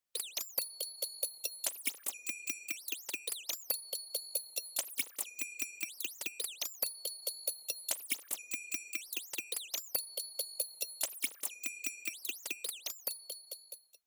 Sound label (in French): Stem 5, version « Mix », après égalisation